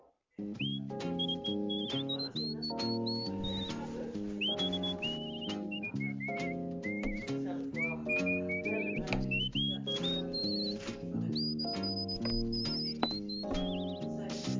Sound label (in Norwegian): plystring; flaklypa